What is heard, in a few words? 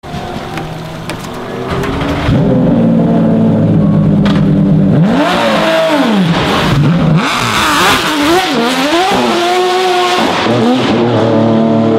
911 rsr start engine